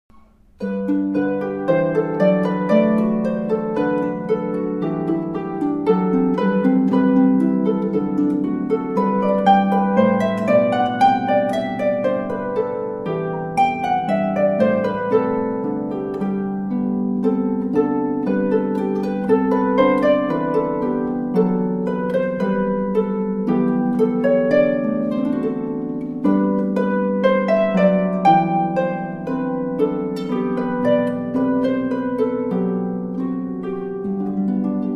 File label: Harpist; Houston; Ode to Joy